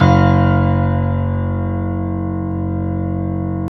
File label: Piano Grand; CMI